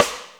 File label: Rimshot, Snares